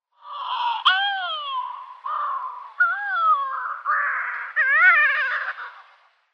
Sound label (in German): Mittelmeersturmtaucher Puffinus yelkouan